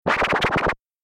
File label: Scratch